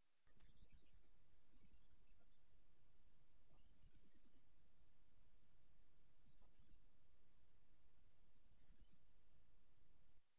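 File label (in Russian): moskovka golos, coal tit voice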